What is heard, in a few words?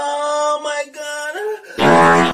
fart